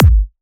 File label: kick